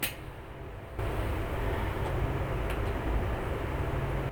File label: woman sigh bedroom